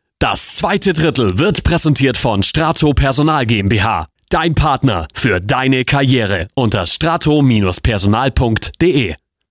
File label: jingle; STRATO